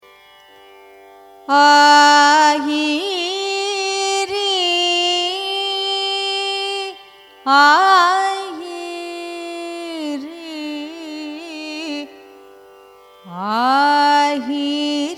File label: raga ahiri signature; carnatic music